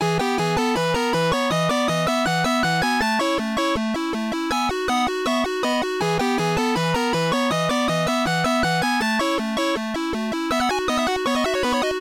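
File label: original ringtones and notifications Samsung Galaxy S22 / S22+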